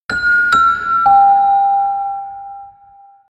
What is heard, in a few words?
Balance, notifications; Ziox Zi4004